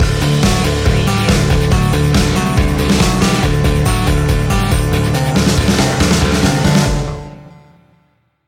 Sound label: power up stinger